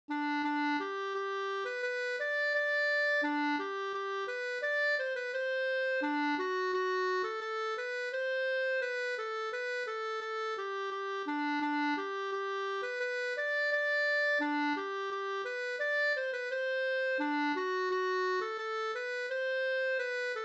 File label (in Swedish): snoa